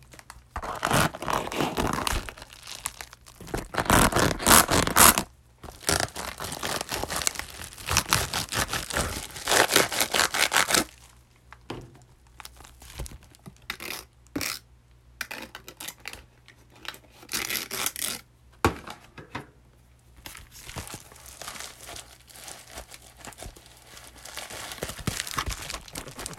skin hair care package

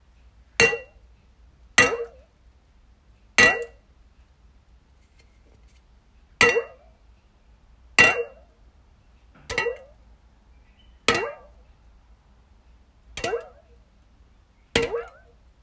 soda can

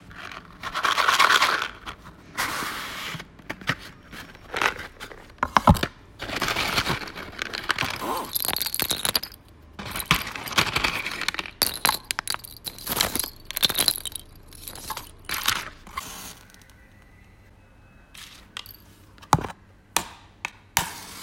Matchstick box

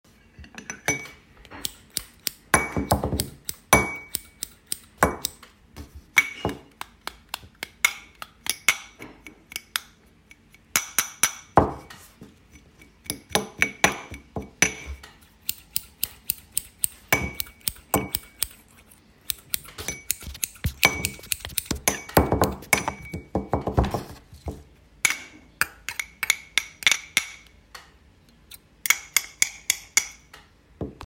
combined instrument

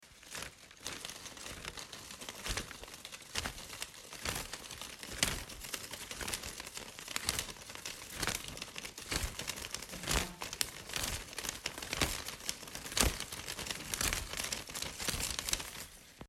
paper instrument

